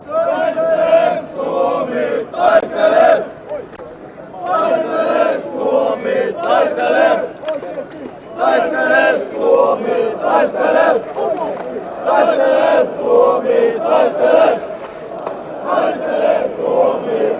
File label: finland chant